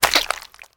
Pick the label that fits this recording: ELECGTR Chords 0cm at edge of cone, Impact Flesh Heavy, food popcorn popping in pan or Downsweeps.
Impact Flesh Heavy